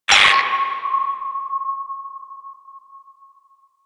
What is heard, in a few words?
Order Ping; Warframe; Veilbreaker; Devourer Kahl